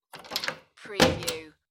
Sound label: Wooden Door Close; household sounds; doors windows locks; lynne-publishing